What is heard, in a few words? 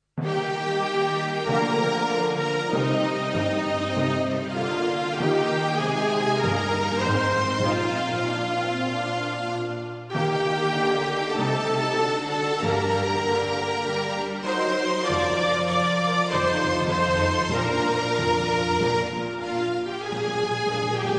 Canada's Anthem; Quoc Thieu; Gia Na Dai